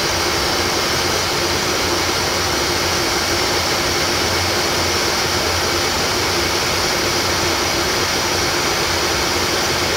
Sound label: Aircrafts Engines Mig15 In Engine; DCS